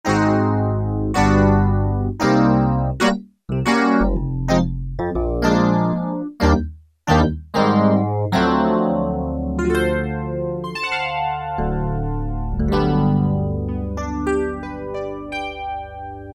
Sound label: VZ PIANO, Casio VZ-10M; Internal factory combi